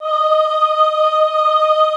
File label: AHH D4, choir; Best Service; Extended Classical Choir; AHH MIX